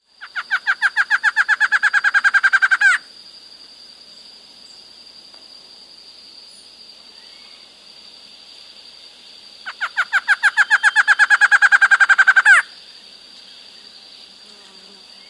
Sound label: Barred Antshrike, Nature's Songs, S. America